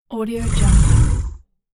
monster roar; hollywoodedge

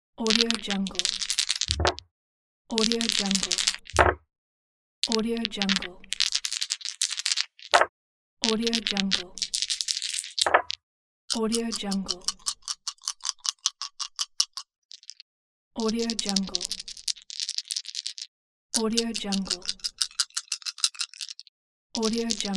dice

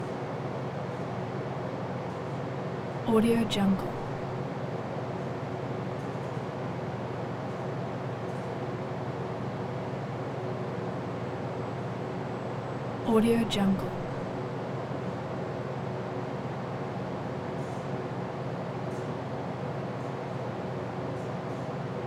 amb room tone

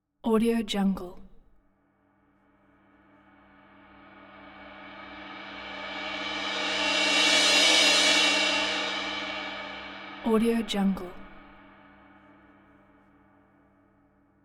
crash bright thin unfinished; flash 18; collanderson

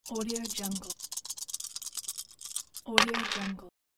dice

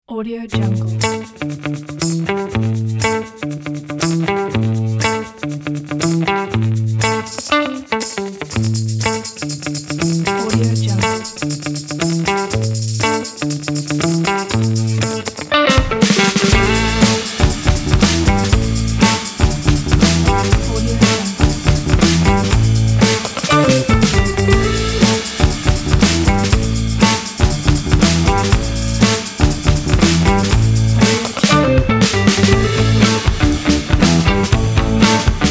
upbeat energetic fun funk groove

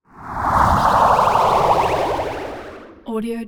pewhoosh

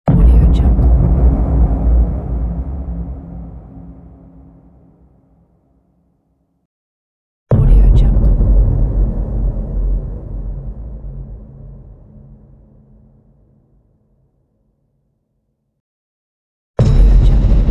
cinematic boom

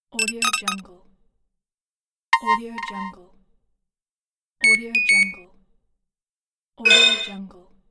multimedia notify

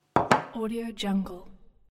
putting coffee cup on wooden surface

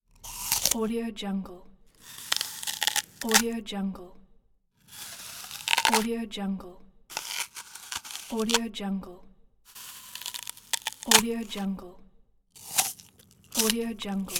apple bite

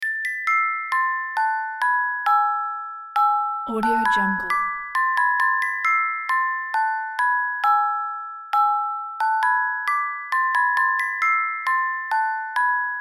magical musicbox

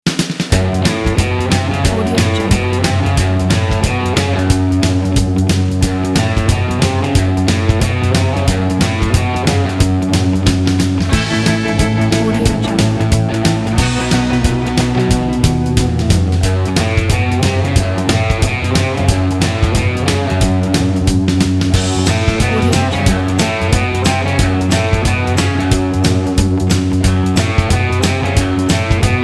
rockabilly surf; paulgraves

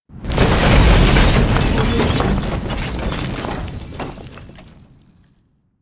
building destruction; sounddivision

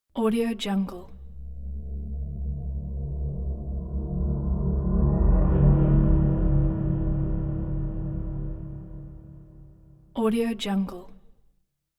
spaceship slow buzz pass by

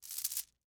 necklace pick up; prosoundfx

